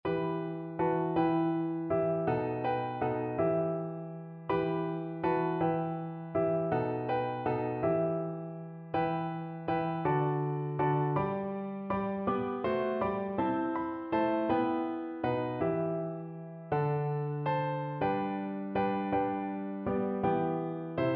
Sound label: we three kings, piano duet